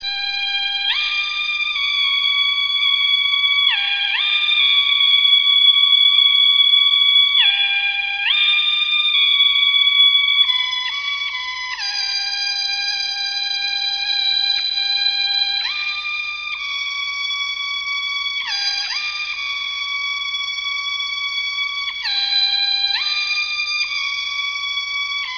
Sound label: flute